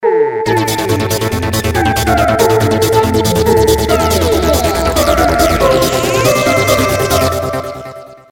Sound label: Melody